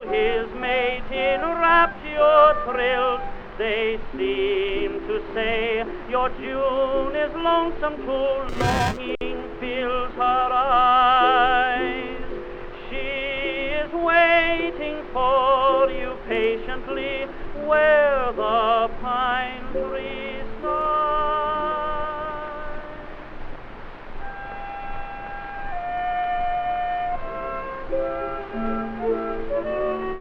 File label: tenor and chorus with orchestra